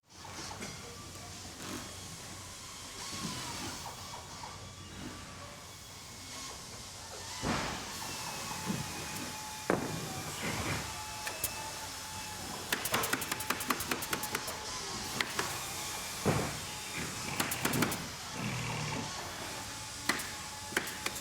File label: factory manufacture background